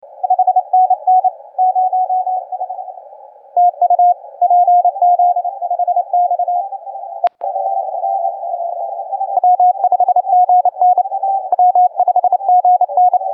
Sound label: cqww cw